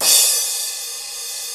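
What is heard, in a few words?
Crash cymbal, Roland JD-990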